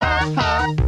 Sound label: Haha